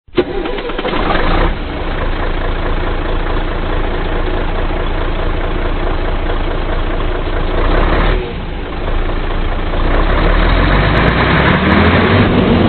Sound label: motorsound, trucks